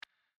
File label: connect